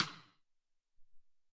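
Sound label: SR16 Stick, Alesis SR-18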